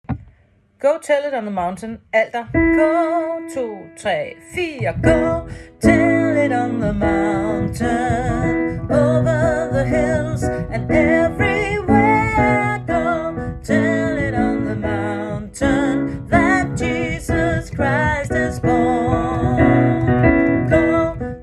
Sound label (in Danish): alter